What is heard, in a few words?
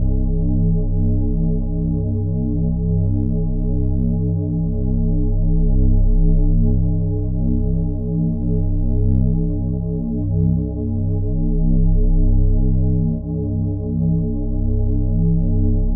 Reese Bass; 808